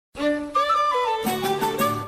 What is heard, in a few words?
SMS; Fairy Tail